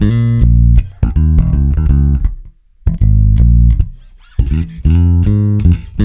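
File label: shuffle A#, bass; Spectrasonics; Bass Legends; SHUFFIT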